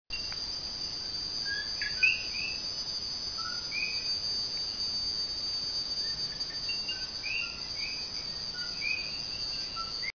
borencona aguadilla el yunque national forest puerto rico; West Indian